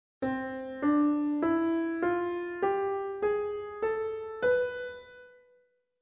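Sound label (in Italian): scala sesta diminuita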